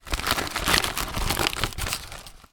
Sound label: Popcorn Sound; پاپ کورن